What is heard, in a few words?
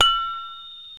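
a pachinko brass metal pin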